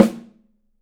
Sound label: Studio Snare; 3D Percussives; Studio Snare 01